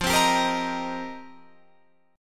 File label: F 7th Suspended 2nd Suspended 4th, Guitar Drop D